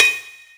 Chink, BossDR660 fx; drum machine

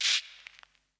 cabasa; eddyflux

